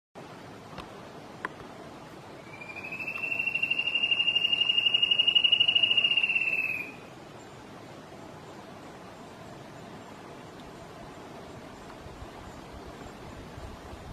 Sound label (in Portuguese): Canto do chocao carijo